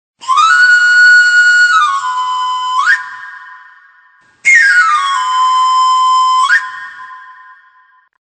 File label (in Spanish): Afilador